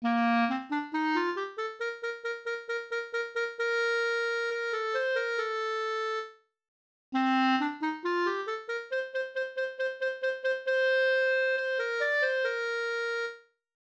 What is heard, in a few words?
clarinet etude, 68